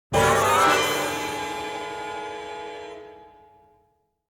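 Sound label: drama suspense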